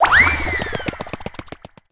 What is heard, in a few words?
run fast